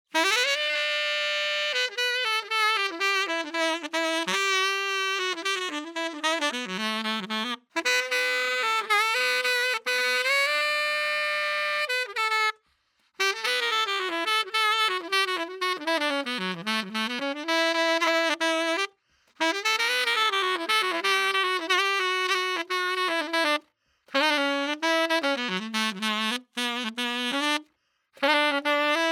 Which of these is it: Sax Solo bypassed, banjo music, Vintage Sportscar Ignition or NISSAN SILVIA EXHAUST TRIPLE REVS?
Sax Solo bypassed